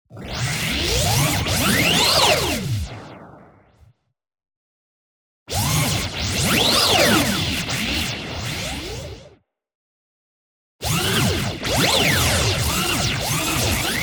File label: movement long, robot power up; boom library; Anime Essentials